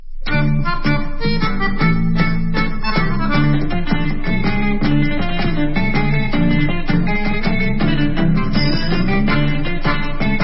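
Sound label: instrumental, Israeli Dances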